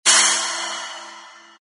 cymbal hit

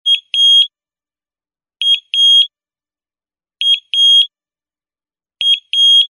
beep double signal

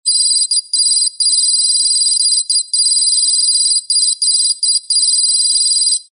comm telemetry data display high

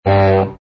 comedy low toot

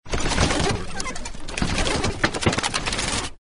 scary cranking the rack

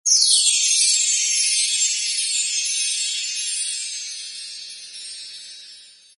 bell magical sparkle gliss

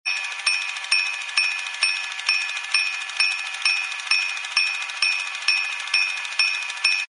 toy bell

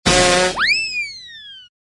comedy honk whistle

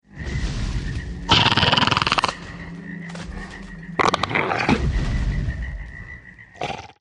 playful giganotosaurus

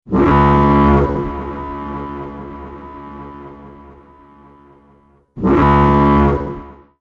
Warning Sound Alarm

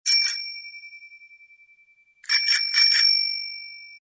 bells bell bicycle repeated ring

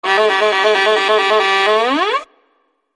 Violin Trill Comedy

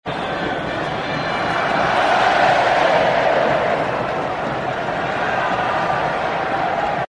soccer crowd rising cheer

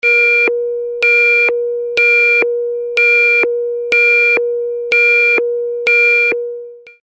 Alarm Force

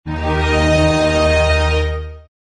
fanfare chord

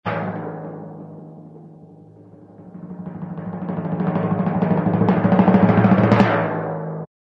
timpani drum roll building

